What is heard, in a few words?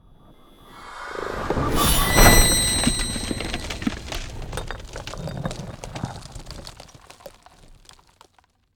Ice Spell Whoosh Frozen Pierce, Cold Whooshes; Arctic Aura